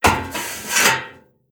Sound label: Metal Turn